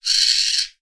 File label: bird owl striped chirp; sound effects one